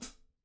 Soft Eager Foot, Hats; Airwindows

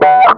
Squawky; Mo' Phatt; Digital Sound Factory Mo' Phatt

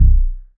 DD BAS, Disco House; Best Service Dance Mega Disco House

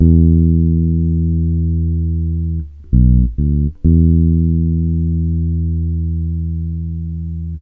FEAR E, Bass Grooves; USB Soundscan vol.30; AKAI

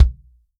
Real Drum Kit, FUNK KIT; Best Service ProSamples vol.43

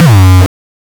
909 Dist Reso Crushed - F#2, Kicks; Drums; Mbase 11 Kicks

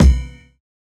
Kick, jungle breaks drum sounds

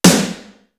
ARENA, East West - Global Sounds; D4 BD SD TOM

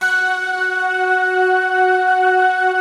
HARPS, Harpsiflute, Slow Harpsi Flute; Optical Media International; Sonic Images Library

